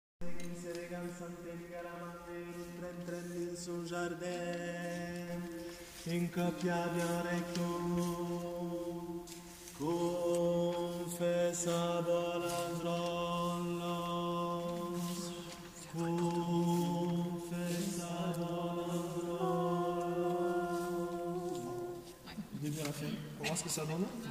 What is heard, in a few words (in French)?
bourdon rector